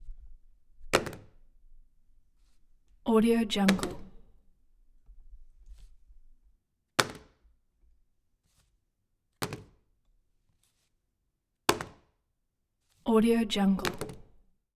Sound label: int cell phone drop on table; collanderson